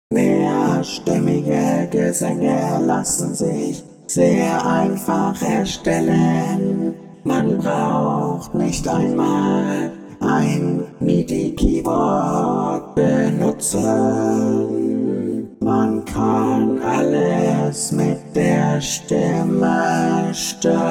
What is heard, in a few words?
Waves Ovox